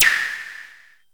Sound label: SYNTH SNAP; Best Service Alpha Dance vol.2